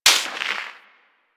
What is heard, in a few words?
Bullet Whizzby; Warframe; Weapons